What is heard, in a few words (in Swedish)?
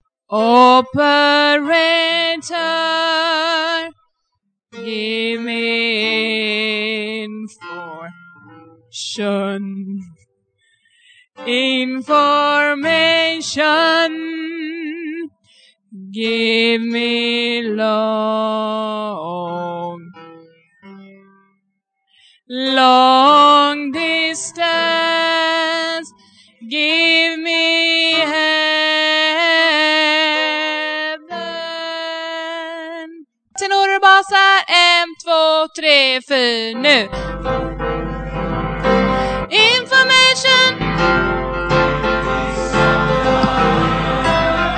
bas